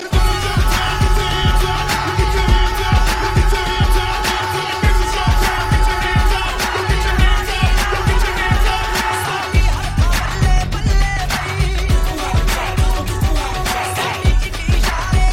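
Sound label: Bhangra Tone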